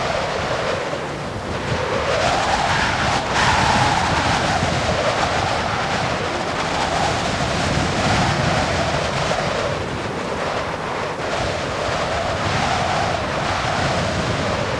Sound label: ambi win; Mafie 1